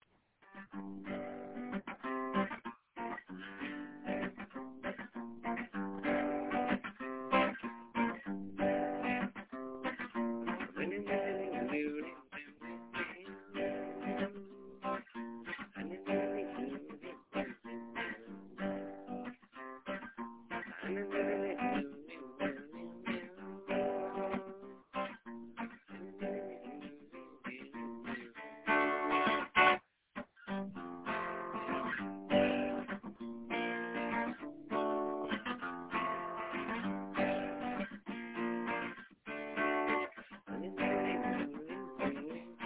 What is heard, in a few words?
backwater chugging song